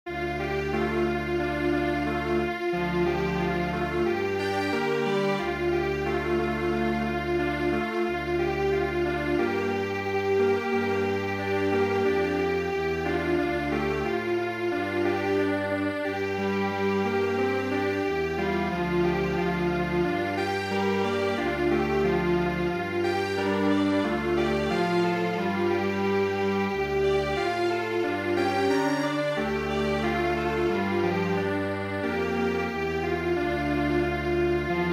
Strings Quartet; hana ichimonme

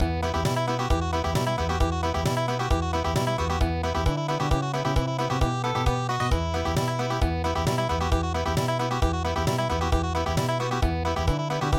Polkka Country

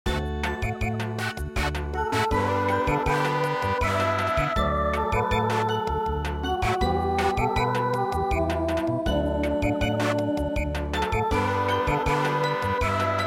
Samba, midi; Schlaf ein mein Blond-Engelein

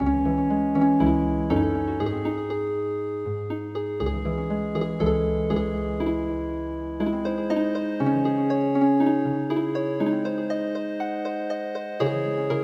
Healing Harp

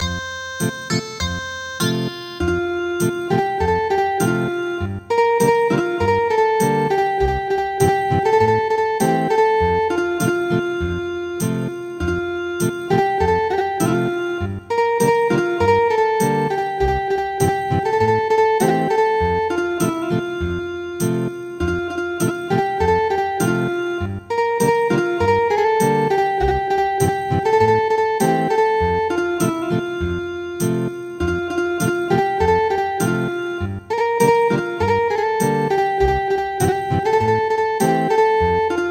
Ethnic Guitar, traditional; midi

